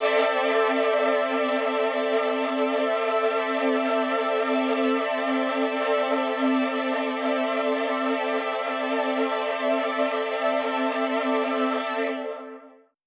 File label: Poly String C6:9, synth orchestra